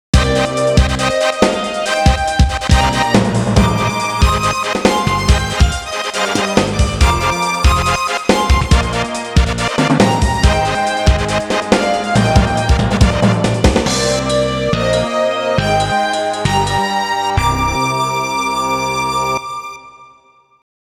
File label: Serious Ending